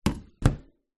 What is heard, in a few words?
household hairdryer set into wall mounted holder hotel; zapsplat